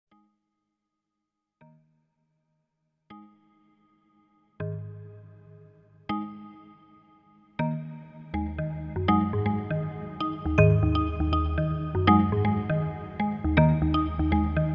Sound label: Marimba